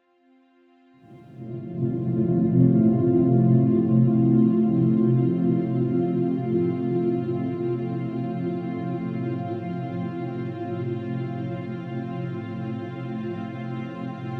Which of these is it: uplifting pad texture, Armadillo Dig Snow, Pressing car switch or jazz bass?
uplifting pad texture